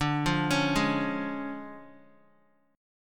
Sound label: D Minor Major 7th Flat 5th, down, Guitar; DmM7b5 arpeggiated; JGuitar